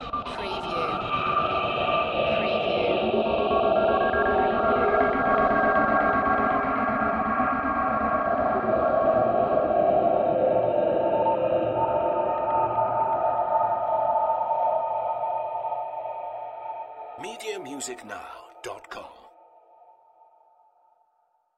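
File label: Production Element Chopper; Sound Effects Bible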